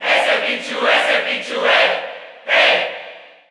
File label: Cheer Spanish; Pichu; SSBU